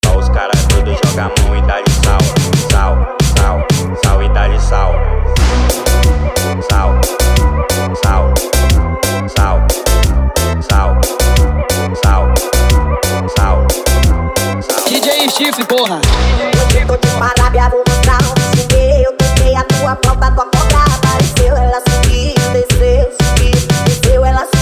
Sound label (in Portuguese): FLAUTA